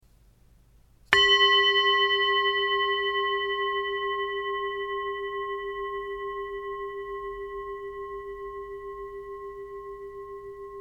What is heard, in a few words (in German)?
Planetenton